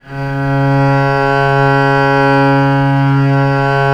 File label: VL C#2, VIOLE, Historical Instruments; USB Soundscan vol.23